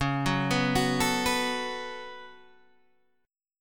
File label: C sharp 7th Suspended 4th Sharp 5th C sharp Guitar Open D; down; chord shape